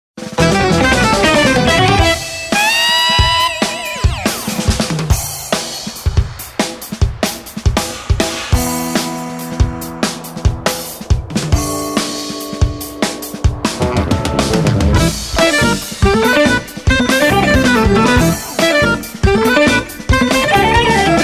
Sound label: GROOVE